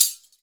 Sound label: TAMB; Univers Sons; Basicussions